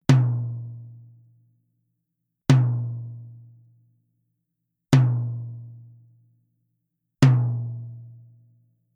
tom reso black medium

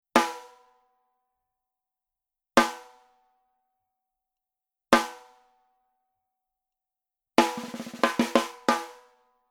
SNARE Dry Rim Shot

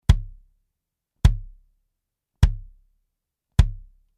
BASS Retro Screen Reso Bury Beater

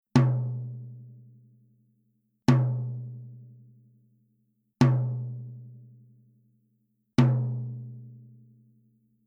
TOM Clear Soft

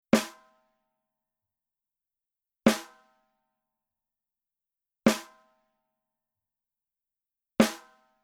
SNARE Power Center Center Hard

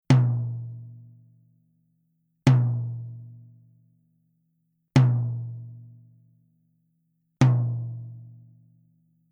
TOM Reso Black Soft

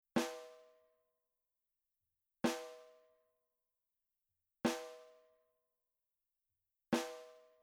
SNARE Coated Edge Soft

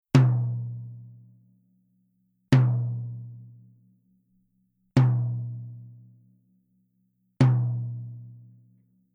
TOM Reso Glass Soft

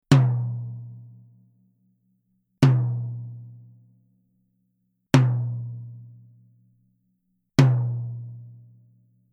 TOM Reso Glass Medium

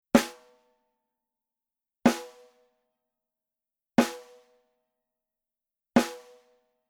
SNARE Center Hard; GeneraHD

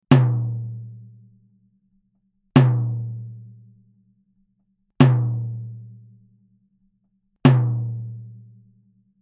TOM GeneraReso Hard

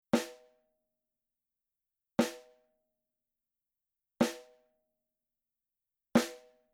SNARE Dry Edge Soft; Genera

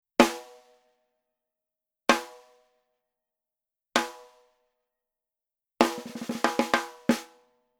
SNARE Rim Shot; GeneraHD

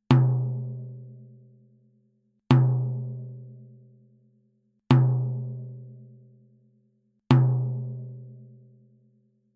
TOM Clear Soft

